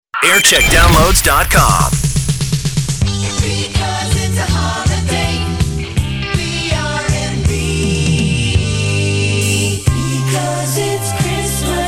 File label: jingle montage; brmb